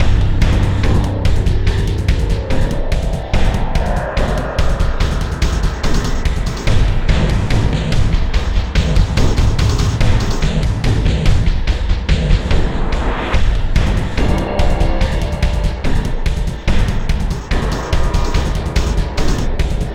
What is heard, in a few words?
Mini Boss Theme; Insanely Twisted Shadow Planet